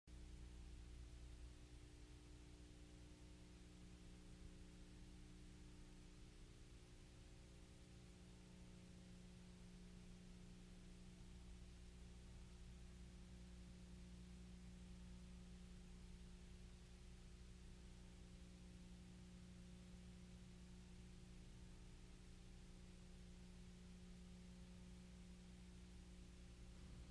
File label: line noise, Vinyl